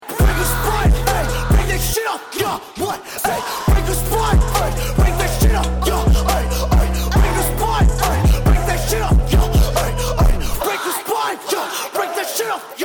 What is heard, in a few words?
start action, music